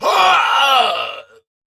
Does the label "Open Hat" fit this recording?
No